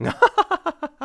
Give Bananas; Tingle giving out banana peels

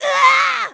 Angry; Link; Zelda

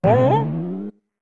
Jump; Goron Link jumping to pound the ground, with four different shouts

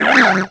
Hit; Boko Baba

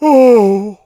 Ohh; King Tuft: "Ohh..."; zelda

